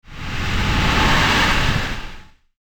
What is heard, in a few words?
galactic whoosh; transitions and movement